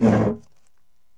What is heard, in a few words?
BOX SLIDE, Box Noises; E-MU Producer Series Vol. 3; Hollywood Sound Effects